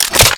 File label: Arch Rocket Crossbow Foley; Warframe; Archwing